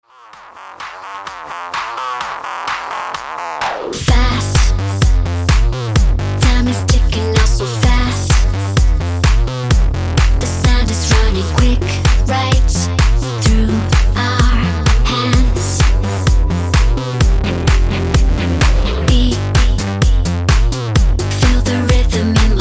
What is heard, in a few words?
Timebomb Ringtone